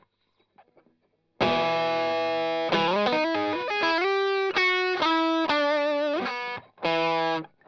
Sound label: Strum Line